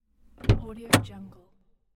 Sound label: car door